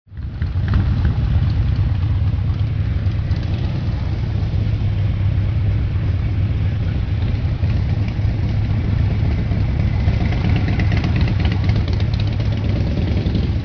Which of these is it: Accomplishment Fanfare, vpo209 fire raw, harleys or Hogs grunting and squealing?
harleys